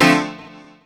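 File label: piano stab, House; E Lab; XTc Files of House; Stabs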